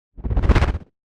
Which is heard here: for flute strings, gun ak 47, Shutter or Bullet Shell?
Shutter